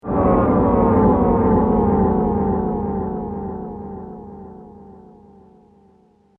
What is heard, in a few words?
Blade Walker Transition, science fiction